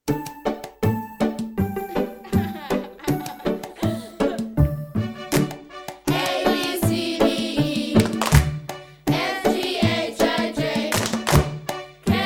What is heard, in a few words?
abc song